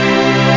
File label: acciiir